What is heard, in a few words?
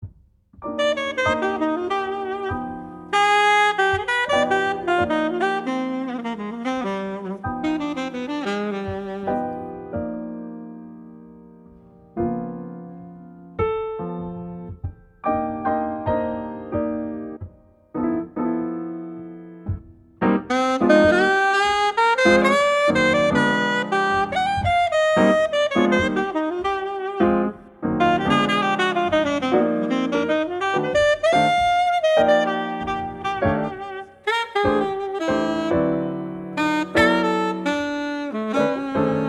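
Jazz trio, Sounds Coffee break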